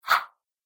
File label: Kung Fu Action Swish, combat swing sound effects